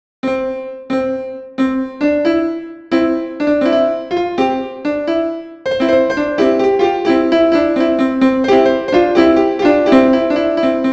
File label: Polyphonic Row